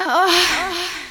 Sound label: female orgasm